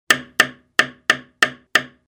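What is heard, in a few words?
knocking sound; zvuk stuka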